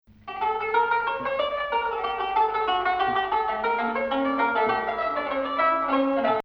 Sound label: Hijaz Octave